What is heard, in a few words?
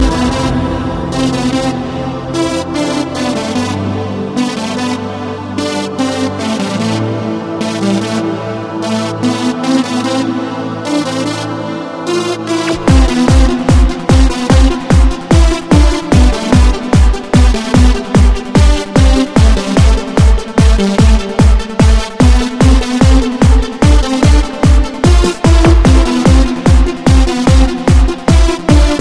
techno eurphoria